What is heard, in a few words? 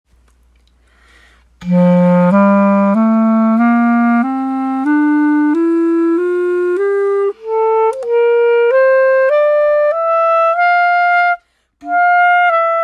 Clarinet g major scale